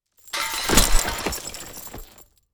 Medieval armor and leather uniform dropping

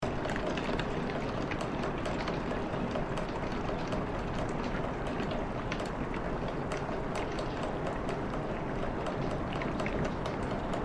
Rain on window interior perspective

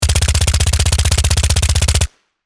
submachine gun short burst; Engineer

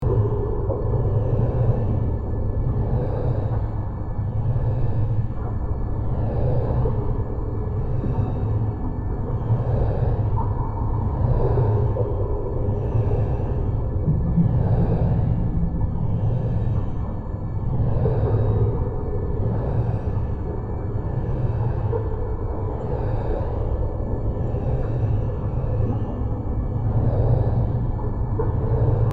Alien cavern base ambience; Sci-Fi Ambience; futuristic world

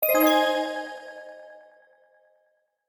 Smartphone notification ping alert tone

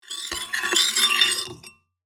Tea spoon light clinking; kitchen

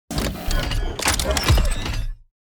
Sci-fi gizmo metallic sequence futuristic

